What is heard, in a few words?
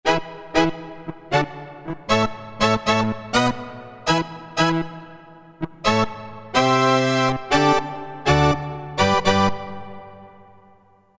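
Brass Section, Roland MT-32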